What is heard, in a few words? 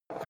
metal small wood pick up short; industrie wirtschaft; metall